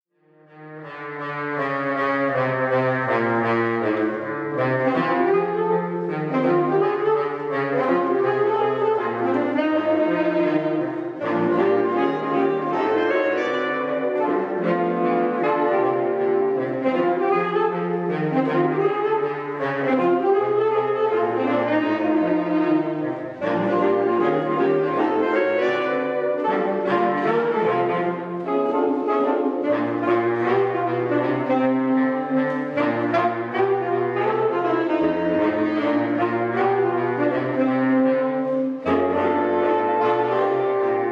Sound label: Blues, saxophone